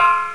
STEEL; Piposh

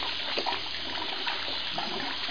WATER; lamsword

